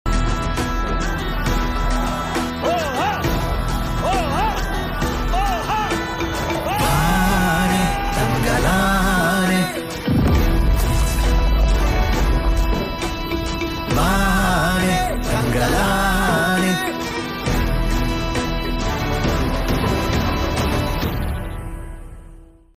trailer bgm ringtone; Chiyaan Vikram

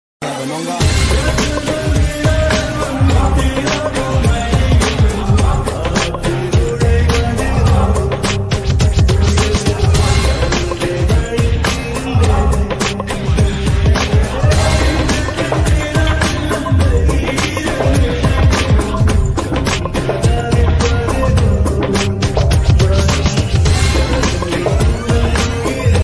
bgm ringtone

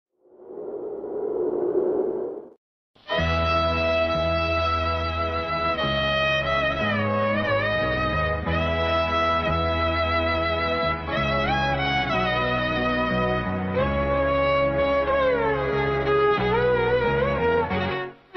instrumental ringtone, bgm; thalapathi; rajinikanth

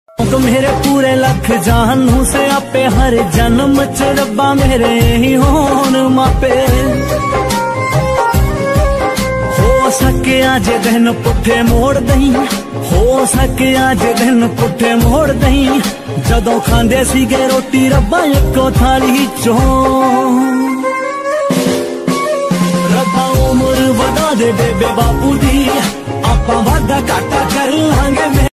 punjabi song ringtone

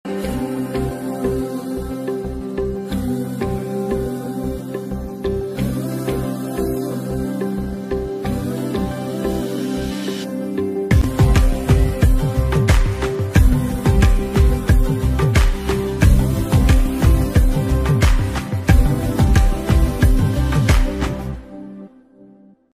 song feel bgm ringtone; song promo; Amaran; Sai Pallavi

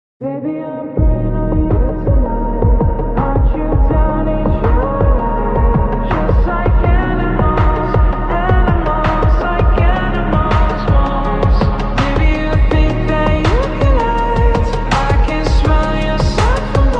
attitude ringtone english ringtone

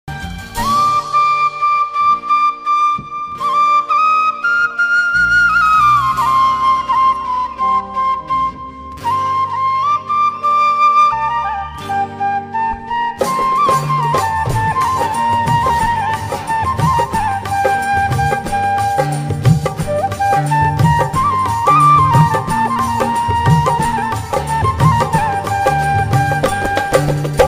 bansuri ringtone, flute ringtone

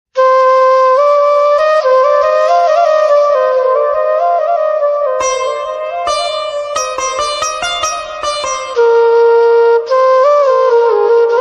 flute music bansuri tone instrumental

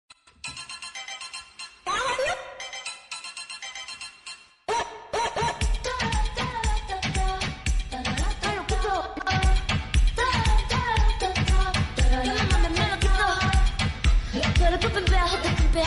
meme ringtone pop ringtone; gmod ringtone

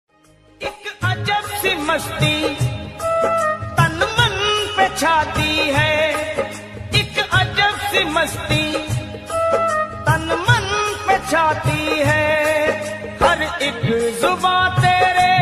bhakti ringtone, maa durga puja ringtone song

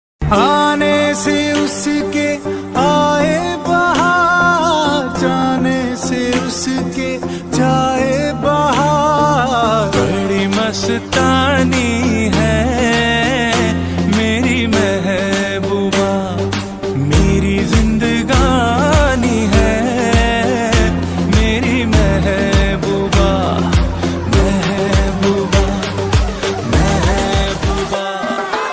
new bgm ringtone

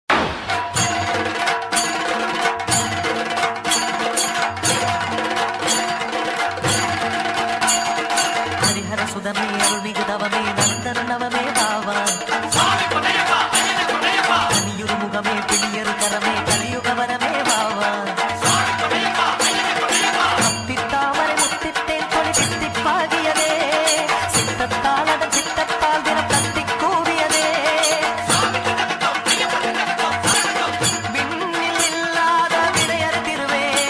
tamil mobile ringtone, mass mobile bgm ringtone; ayyappan

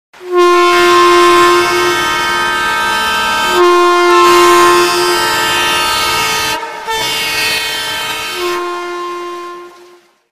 train song ringtone indian

